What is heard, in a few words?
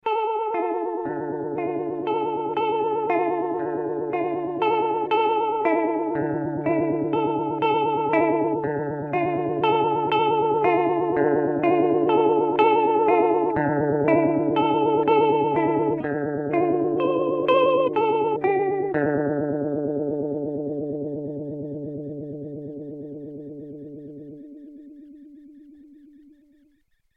Fender Highway Phaser